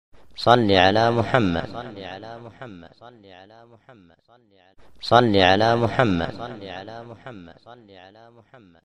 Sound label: islamic sms ringtone